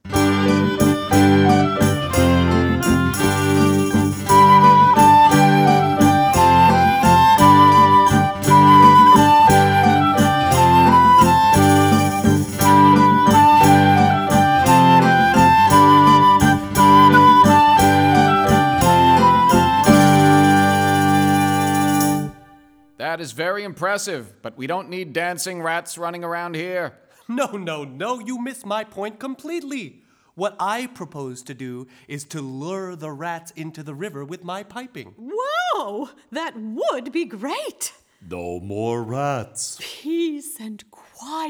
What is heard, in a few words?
Tune; 2nd and 3rd grade musical rats